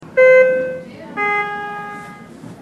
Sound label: bing bong